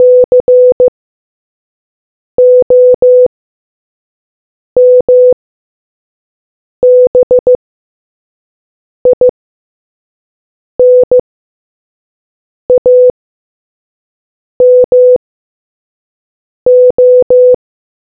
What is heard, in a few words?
morse